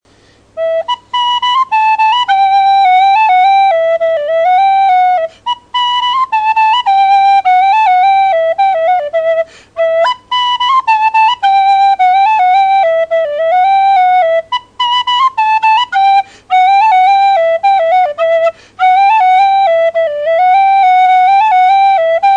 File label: burke, aluminum pro soprano d